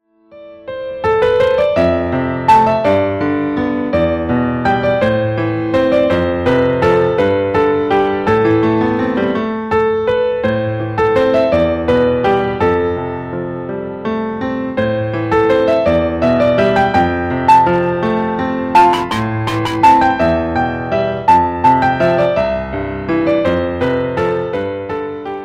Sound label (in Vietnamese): Du tren mat trang lang man nho trong lanh nhac piano